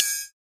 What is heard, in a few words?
metal hit